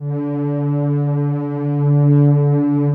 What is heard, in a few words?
Prophet 5 PAD D4